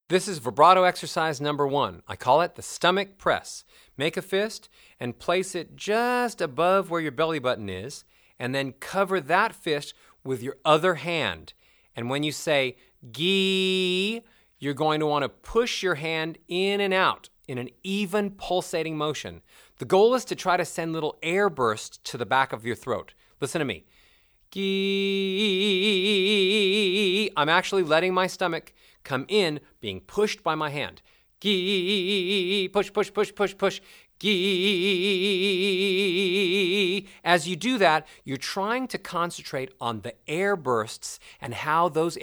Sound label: Stomach Press Vibrato